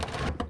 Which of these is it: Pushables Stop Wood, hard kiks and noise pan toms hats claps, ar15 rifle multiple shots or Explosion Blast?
Pushables Stop Wood